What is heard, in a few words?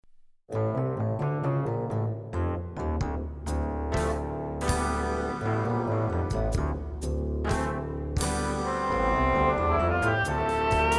Oboe; Doodles